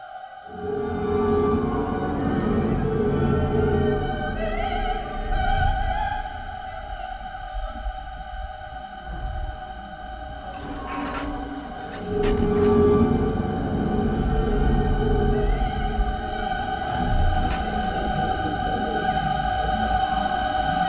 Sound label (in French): Phasing Infinit Reverb; Temps gel; Chiaroscuro